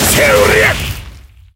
hit

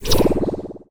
event board tile

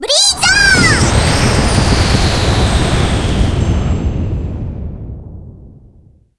skill blizzard

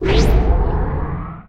lab loading loop

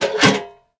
click box

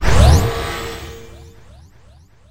Space Train buff

